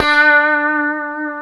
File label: D, Guitars; Spectrasonics; Hans Zimmer Guitars VOL-1; COMP POP+VIB